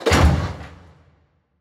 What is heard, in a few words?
Tank Land